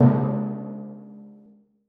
timpani f rh c2; Percussion; Sonatina Symphonic Orchestra

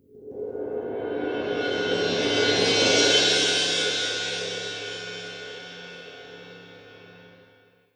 cymbal roll mf; Percussion; Sonatina Symphonic Orchestra

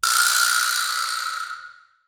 vibraslap; Percussion; Sonatina Symphonic Orchestra

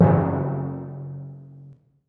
timpani f lh f#1; Percussion; Sonatina Symphonic Orchestra

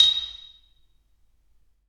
xylophone a#5; Percussion; Sonatina Symphonic Orchestra

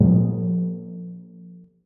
timpani p lh a1; Percussion; Sonatina Symphonic Orchestra

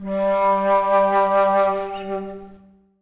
alto flute g3; Sonatina Symphonic Orchestra

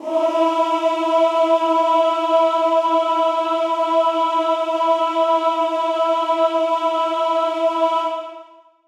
chorus male e4; Sonatina Symphonic Orchestra

